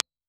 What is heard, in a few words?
Beomic 1000, IR